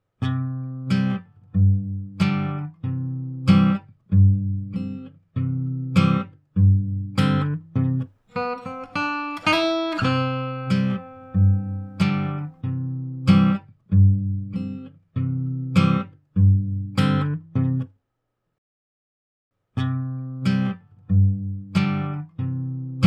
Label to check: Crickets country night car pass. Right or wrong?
Wrong